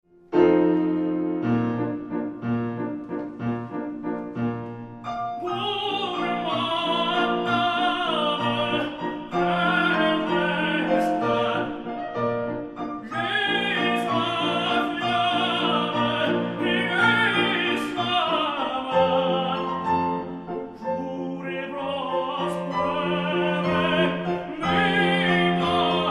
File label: Ah me amis, Tenor; Donizetti; Meisterkurs